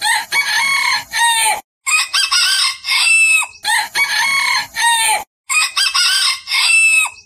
3d bird tone; animals

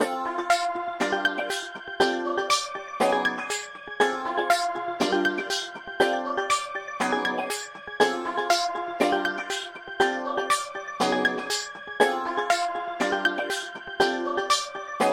Guitar

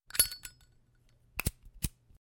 Zippo Lighter

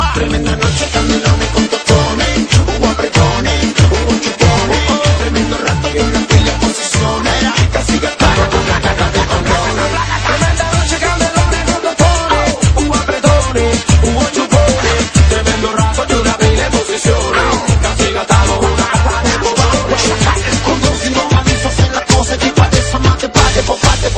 cool music; latino

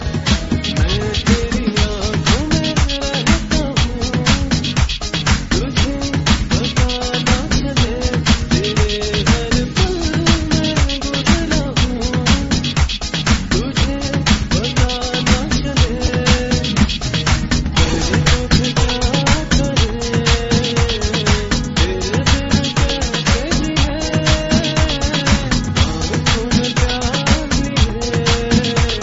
sajni jal desi tune

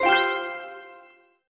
card ready

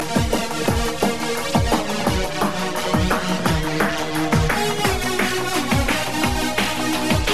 Rock

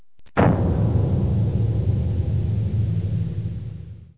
tardis landing, electronic ringtones

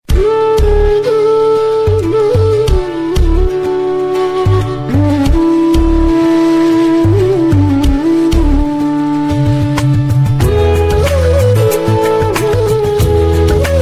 flute

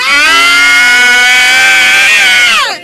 Scream

